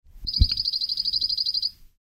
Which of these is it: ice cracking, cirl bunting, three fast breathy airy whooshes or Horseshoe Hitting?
cirl bunting